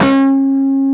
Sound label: PRS PWR D4E, Guitars; PRS PWR CUTS